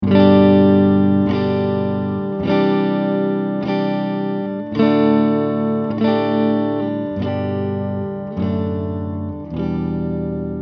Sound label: cable test reference